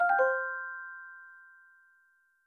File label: exit call